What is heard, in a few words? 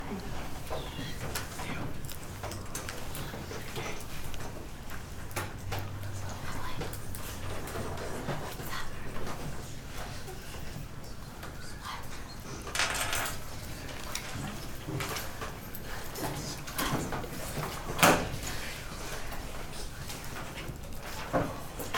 Classroom Students Whispering Presence